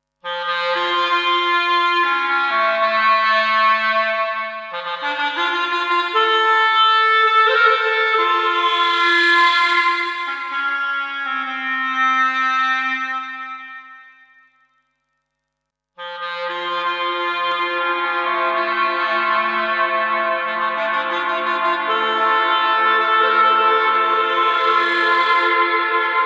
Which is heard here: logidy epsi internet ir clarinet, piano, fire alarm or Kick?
logidy epsi internet ir clarinet